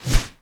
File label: Quick Whoosh